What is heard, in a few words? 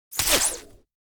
web release wet smack sticky; prosoundfx